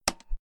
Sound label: spin; slot game; Lucky Joker 5